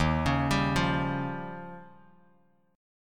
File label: Eb 9th, Bass Standard; arpeggiated; 11,16,17,10; JGuitar